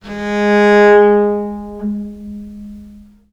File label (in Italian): G#2, Historical Instruments, VIOLE MUT; USB Soundscan vol.23; AKAI